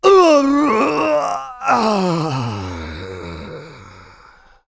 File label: death, player; DoomRTX; new player